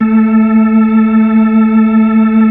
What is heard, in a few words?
B-3 C306 69 L, Keyboards of The 60's and 70's; Full Xfade